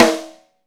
YAMAHA SNARE

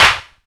PRC, Bonus Perc; Northstar - Drumscapes Roland

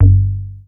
G2, BASS; Q Up Arts; Dream Experience; BASS BANK 7

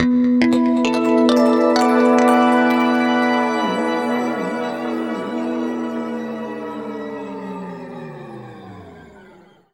harmonic, guitar

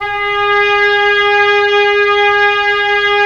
WND OB P, Oboe p; Roland - LA Composer vol. 4

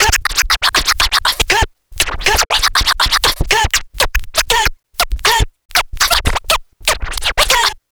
Scratch, Trance

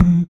Body Perc

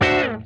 D SWOOP, Funk Guitar; Zero G - Funk Guitar

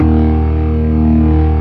STOPS, ORGAN; Keith Emerson Signature Series

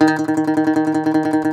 DOMRA, Segundo Domra, Plucked Strings; E-MU Producer Series Vol. 7 – Old World Instruments

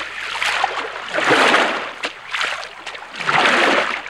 ROW, Boat Rowing; Water

